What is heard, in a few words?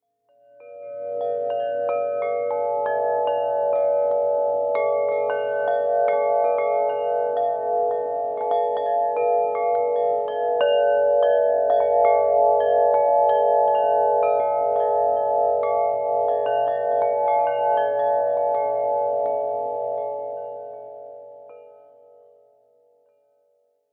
Chimes; Serenity